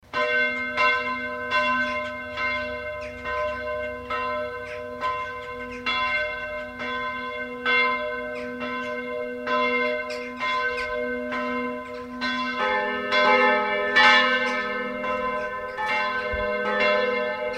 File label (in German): Glockengeläut St Peter und Paul Leuggern